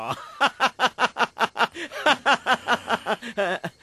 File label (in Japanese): 笑い声; 富竹